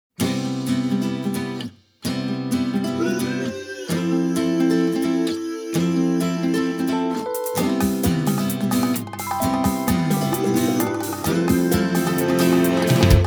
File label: instrumental